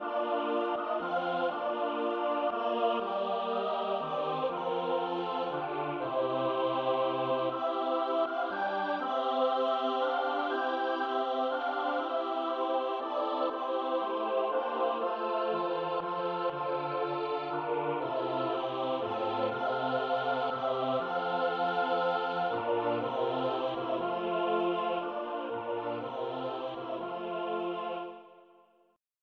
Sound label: tutti chorus